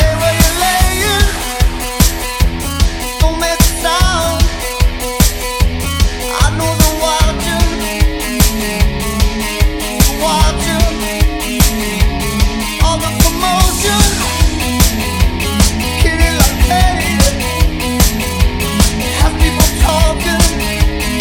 Slam End, 150bpm E; remixplanet

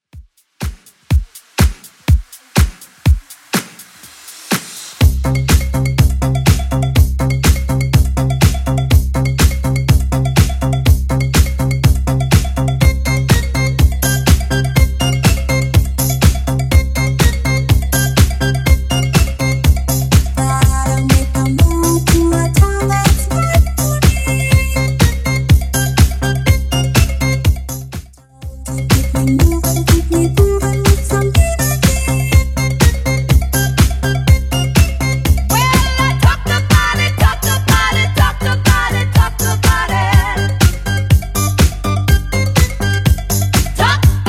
80s Simple Redrum 123bpm

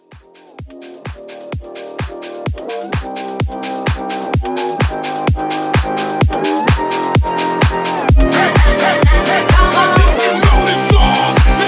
Rage Edit 128

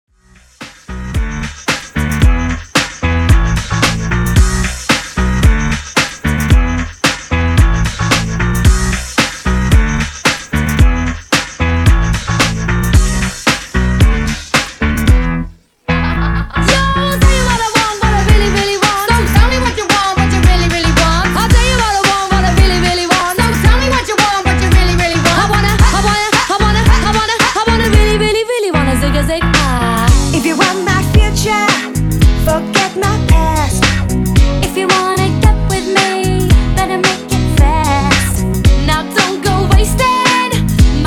Re drum

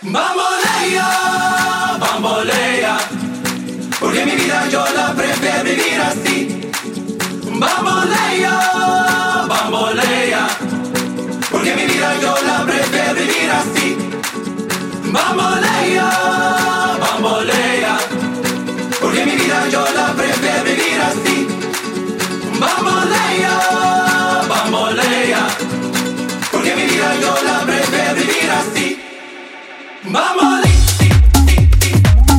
Tech House; Remixfreakz

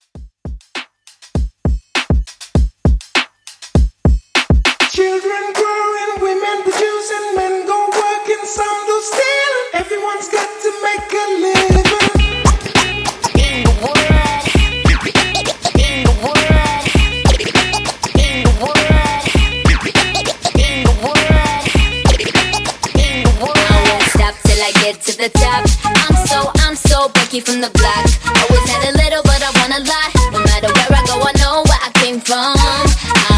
friendly redrum 100 bpm

